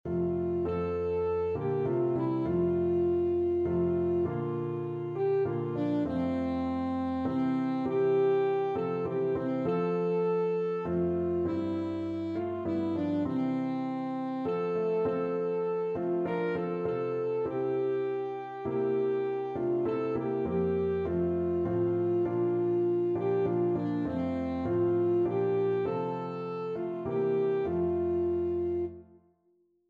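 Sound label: alto saxophone; i need thee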